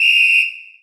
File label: Basketball Whistle